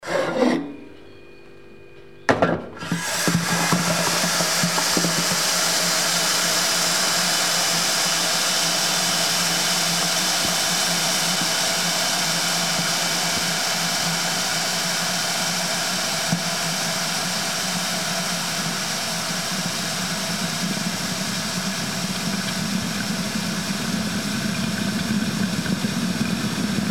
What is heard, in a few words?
coffee pot